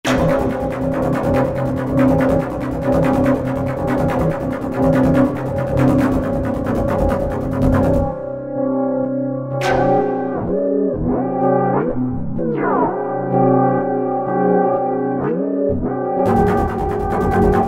Sq Zambeezi, Yamaha EX5R; Factory presets

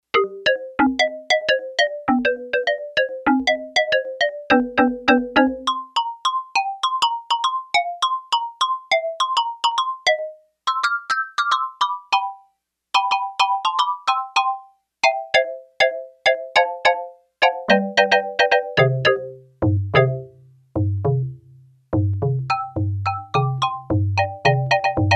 Nu Marimba, Yamaha TX802; Factory Patches; Voices

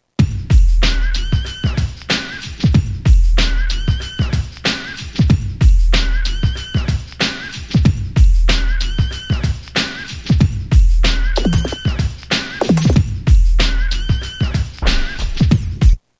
Nite'Hood 94; Roland M-DC1

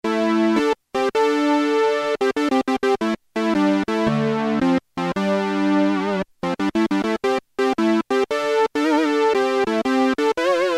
Fat Fifth, Roland JX-3P

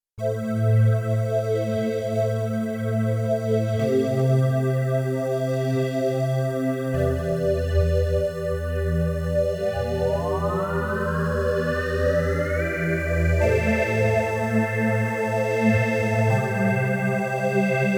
Soft Fantasy, Korg Radias-R

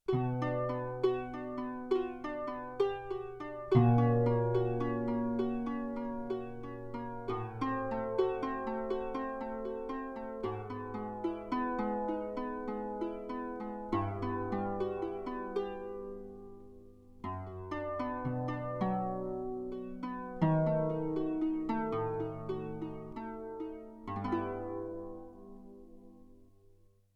Harp-Stereo, Yamaha TX16W

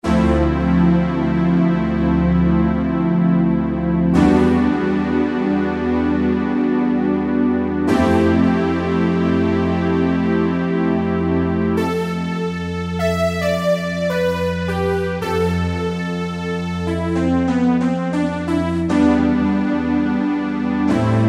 Hybrid Stack, Kurzweil K2000; Kurzweil K2000 Contemporary ROM

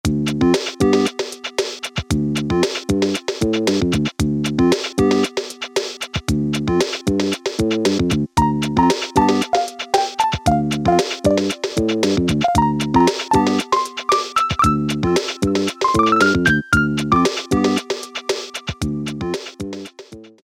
HOUSE 3, Casio Rapman rap patterns

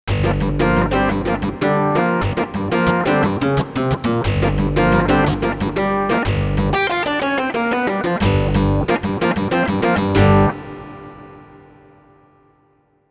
Box Clavi, Korg MSC-1S; Korg MSC-1S Variety

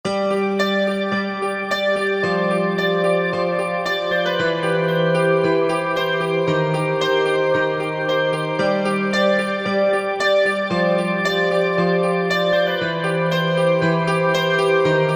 Layer Piano, Korg MSC-9S Organ

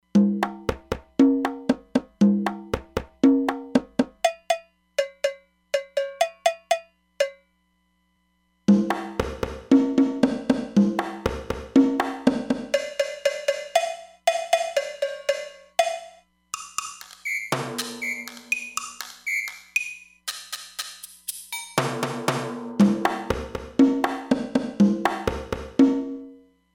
Small Panning Room, Roland DEP-5; Factory Patches

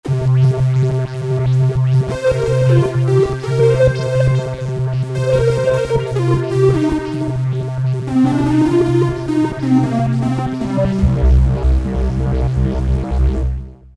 syn Vapor, E-mu Orbit V2; Factory presets

